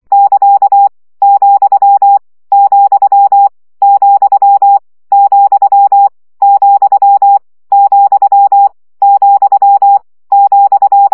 CW gyakorlas vesszo 20 baud

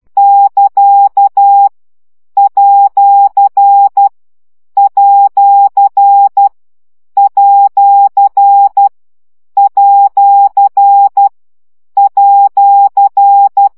CW gyakorlas kukac 10 baud

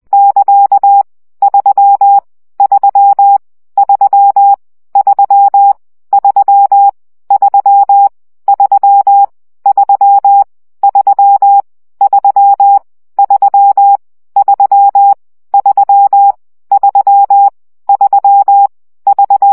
CW gyakorlas 1 17 baud

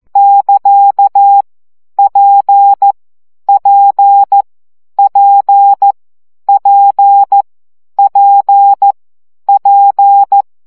CW gyakorlas p 12 baud

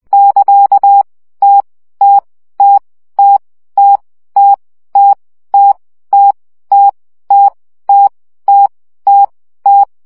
CW gyakorlas t 17 baud

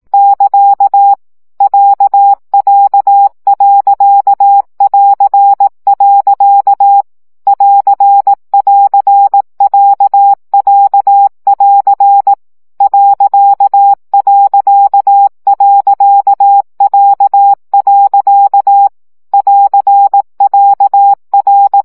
CW gyakorlas a2 plusz pont 15 baud

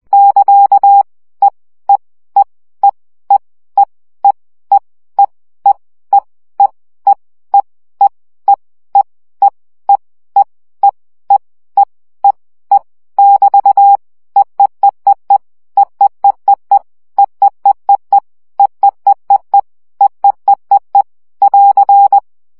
CW gyakorlas e 17 baud

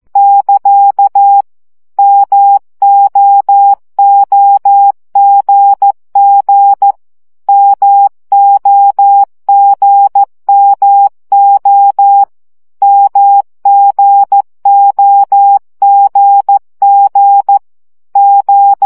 CW gyakorlas m g o 12 baud

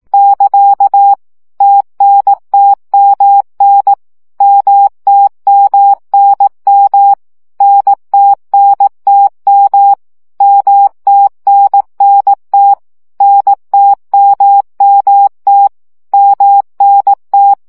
CW gyakorlas t n m 15 baud

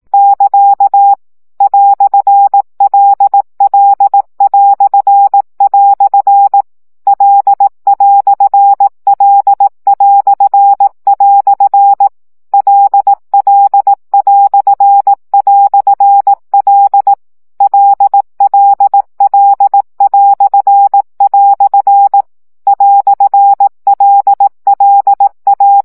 CW gyakorlas l idezojel 15 baud

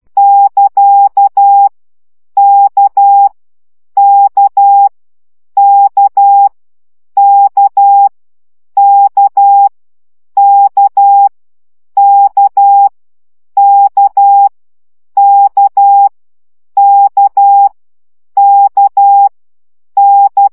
CW gyakorlas k 10 baud

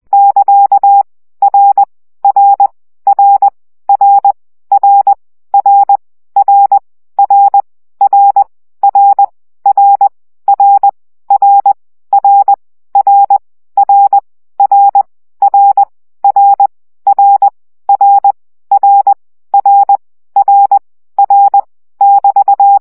CW gyakorlas r 17 baud

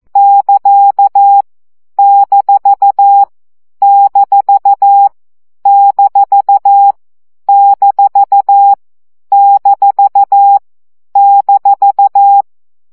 CW gyakorlas minusz 12 baud

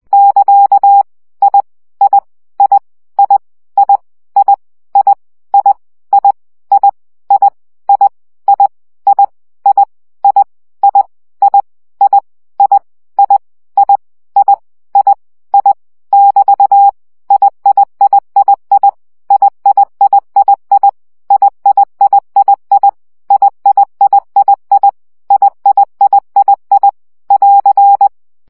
CW gyakorlas i 17 baud

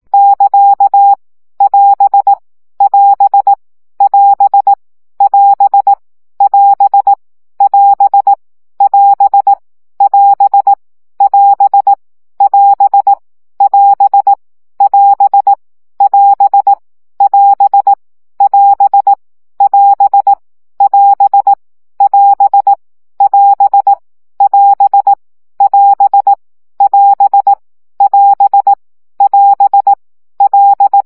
CW gyakorlas 15 baud

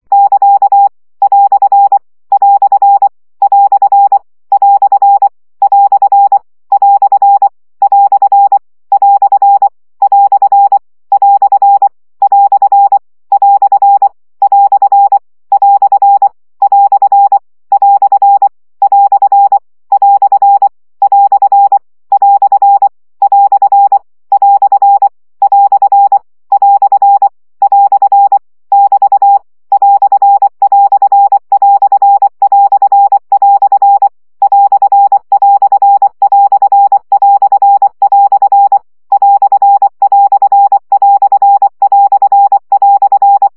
CW gyakorlas idezo 20 baud

